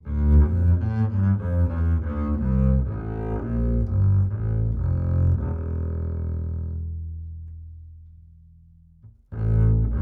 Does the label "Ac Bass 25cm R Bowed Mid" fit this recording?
Yes